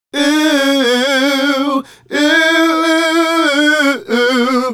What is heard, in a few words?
Male Group, Vocal